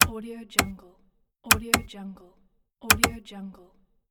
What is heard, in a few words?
the switch